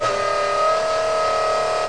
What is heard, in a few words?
Servo